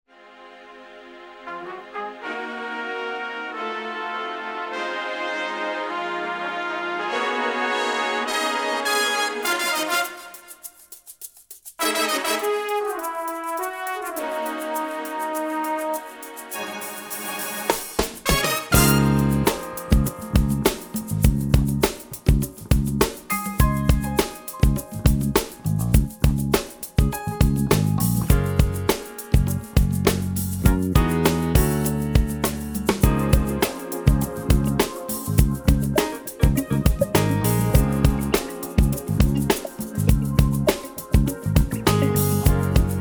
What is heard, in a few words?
instrumental